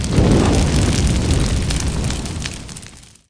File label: fire start